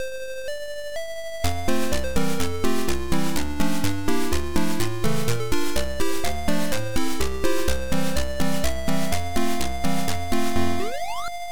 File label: Game music; Storm; Stax